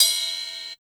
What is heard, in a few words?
CYM, Drum & Bass, 160 BPM; Ueberschall; Drum & Bass Flash Trackz